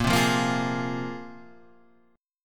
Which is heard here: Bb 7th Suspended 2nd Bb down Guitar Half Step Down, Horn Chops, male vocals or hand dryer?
Bb 7th Suspended 2nd Bb down Guitar Half Step Down